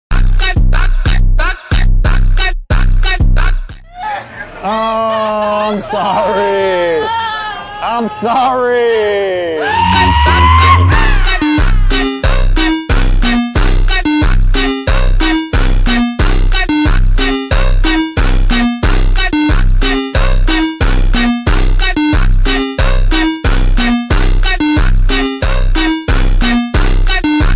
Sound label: automotivo slowed